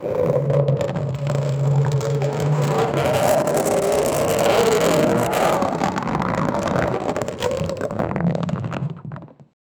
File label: arcade racing wheelchair